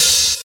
Thin Open High-Hat Sample G Key, Royality free open hat tuned to the G note.